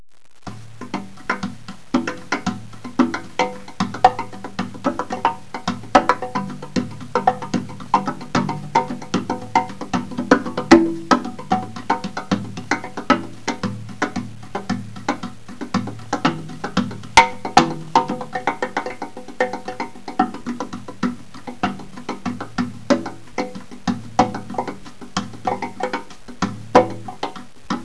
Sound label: music